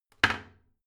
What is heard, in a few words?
toilet seat slam soft